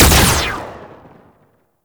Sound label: Laser Fire; Enemies; Weapons; Warframe; Tau; Sentient Dactolyst